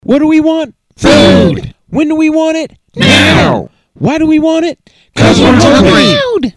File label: food chant